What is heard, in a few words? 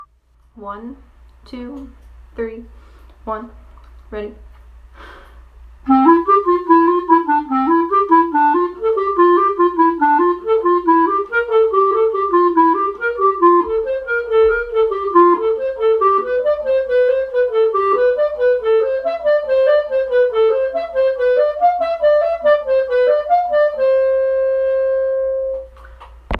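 trombone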